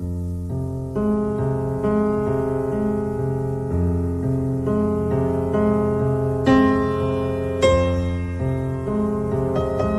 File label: Piano